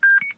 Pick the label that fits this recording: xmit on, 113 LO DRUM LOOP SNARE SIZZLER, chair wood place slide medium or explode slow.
xmit on